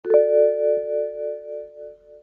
bmw

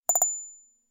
notification

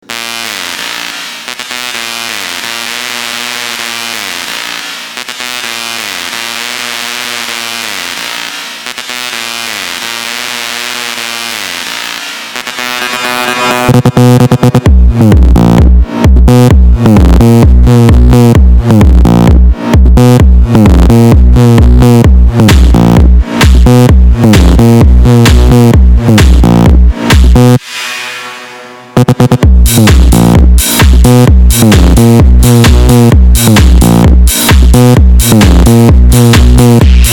megabas; ekstra basslı versiyonu

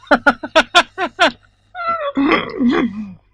hahahahaha; kemal sunal

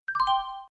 windows notification old; Eski Windows Sesi

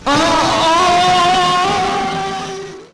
aaaaaaa; kemal sunal

